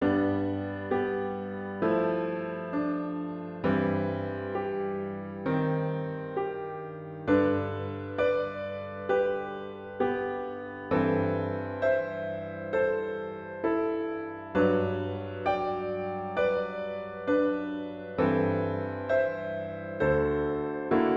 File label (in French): violon